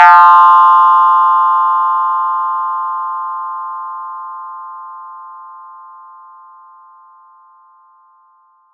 FX RINGR; ModelCycles

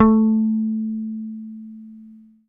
HOFNER SUS A3, Bass; Sampleheads; Will Lee Bass Library

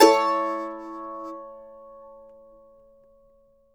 mando maj; Sampleheads; Whole Lotta Country 1 & 2

